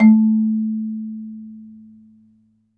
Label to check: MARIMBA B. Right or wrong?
Right